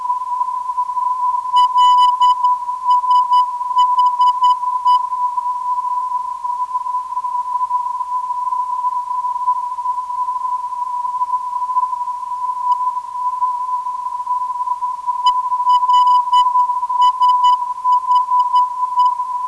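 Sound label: 340kHz, non-directional beacon; LSH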